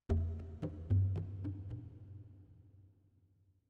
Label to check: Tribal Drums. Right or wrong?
Right